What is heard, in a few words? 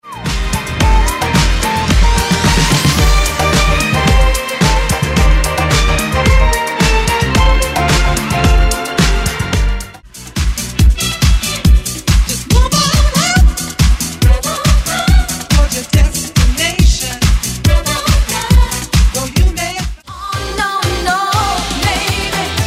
Late 70s Early 80s Disco Pack